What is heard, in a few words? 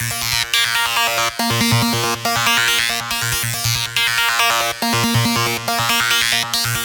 A# 140 BPM, arp loops; Psytrance; Mana